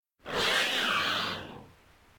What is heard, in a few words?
shrimp Death